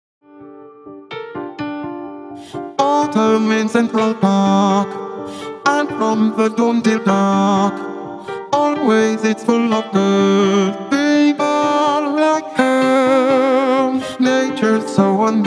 Vocaloid

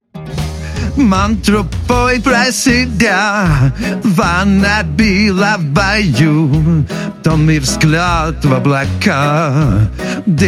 Male Strained Rock; RVC

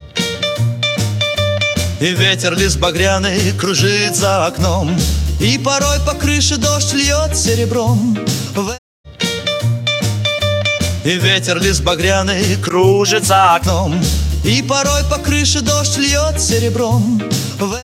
Re-sung

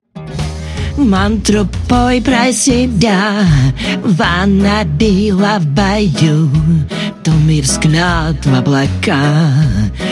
Female; RVC

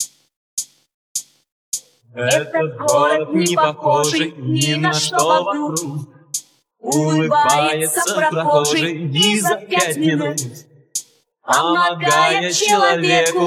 Fem

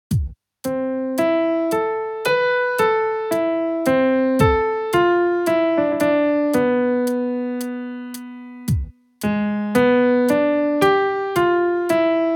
Cello

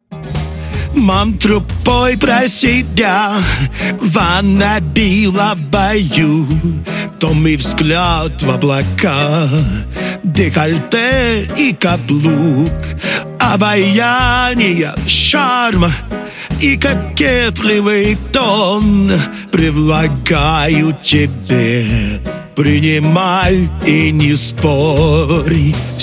Male Gospel; RVC

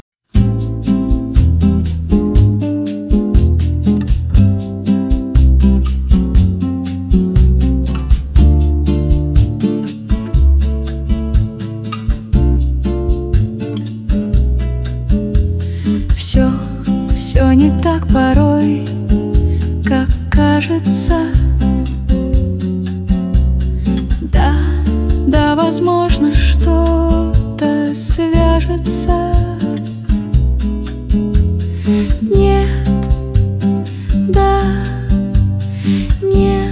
Bossa nova

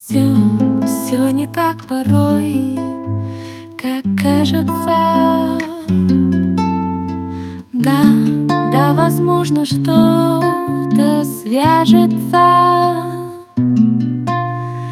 Bossa nova

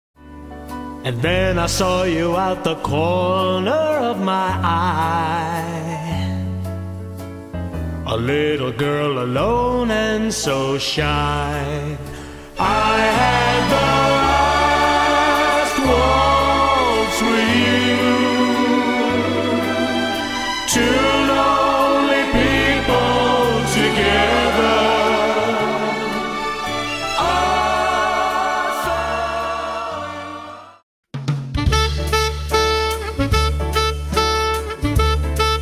Waltz 3'4-4'4

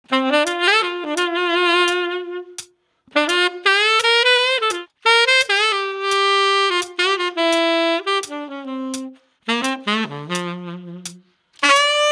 Sax

